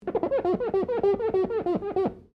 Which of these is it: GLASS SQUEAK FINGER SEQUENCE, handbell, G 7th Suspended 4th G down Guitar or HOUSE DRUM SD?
GLASS SQUEAK FINGER SEQUENCE